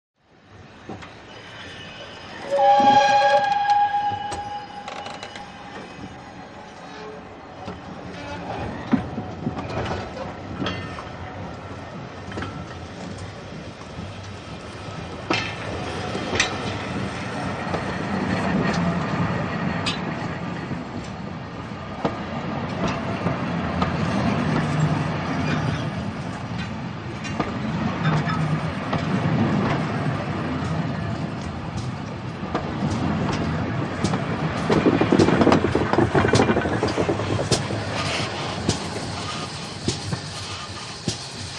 Train In Steam Train With A Very Slow Approach; Hollywood Edge 3-D SFx

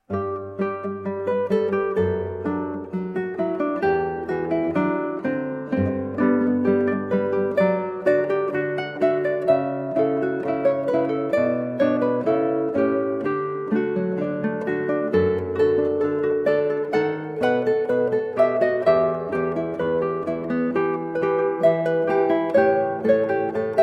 Andante from Trio Sonata, Guitar

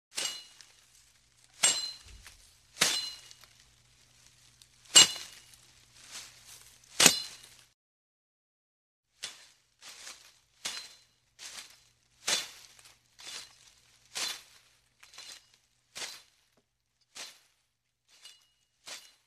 Brush Cutting; Hollywood Edge Foley Sound Library

